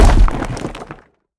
hit rock; Rapids; scrat

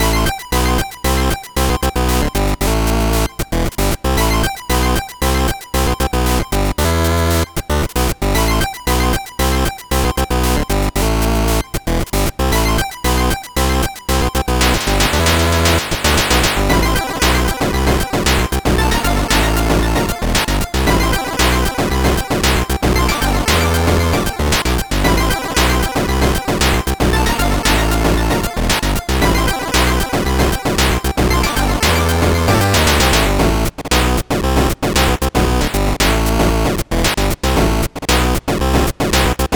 Background - Volcanic Dungeon; dwellers empty path

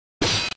metal

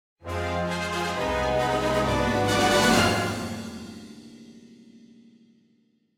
Start 135bpm; Legends of Pegasus; windows gamerip 2012

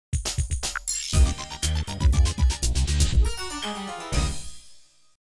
Stage Completed; hotel mario remastered soundtrack extended edition; Morton's WoodDoor-Hysteria Hotel

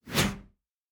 Synth Whoosh

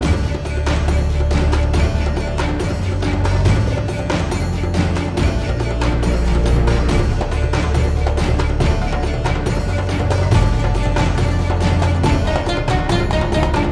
Combat Low

